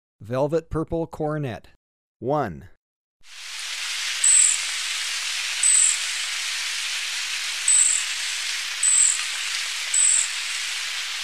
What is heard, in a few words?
Velvet-purple Coronet; S. America